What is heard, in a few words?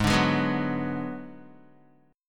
Ab Minor 7th Flat 5th Ab, down, Guitar, 4E, 4A, 5D, 5G, 5C, 6F; strummed; JGuitar